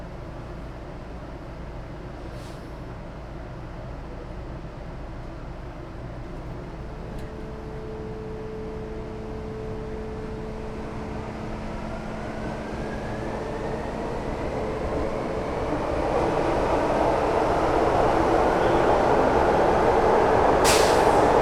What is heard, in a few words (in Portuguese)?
Metro de brasilia dentro do vagao subsolo viagem longa parada devagar